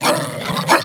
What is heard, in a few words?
Puppy Idle Growl Playful; Vocals; Warframe; Kubrow